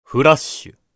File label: Flush, board game casino mahjong